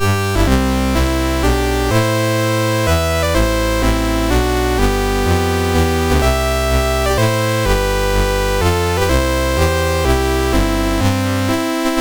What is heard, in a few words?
sid, MOS6581R3; Double Dribble